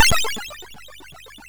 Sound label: MOS6581R2, sid; Defenders of the Earth